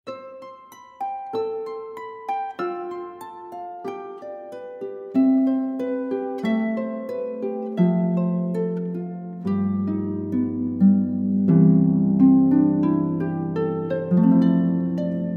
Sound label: solo harp